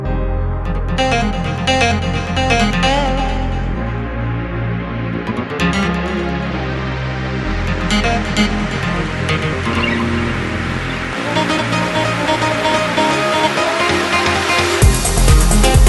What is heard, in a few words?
Guitarra; Banda Sonora